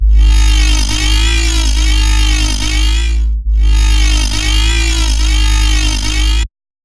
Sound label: Bassline B2 140, Dubstep; GhostHack